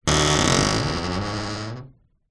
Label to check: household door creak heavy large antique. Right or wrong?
Right